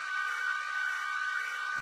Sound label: predator search